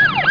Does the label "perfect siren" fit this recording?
Yes